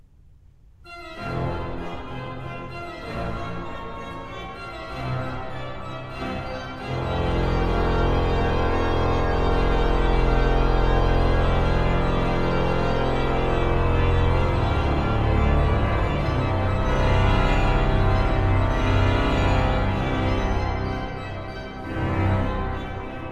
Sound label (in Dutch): orgelklanken; Bekende orgelklanken